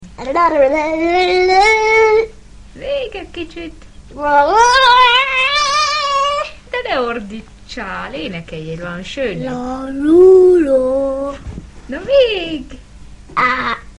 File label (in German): singen; sedrun